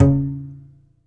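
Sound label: contrabass balalaika, Plucked Strings; E-MU Producer Series Vol. 7 – Old World Instruments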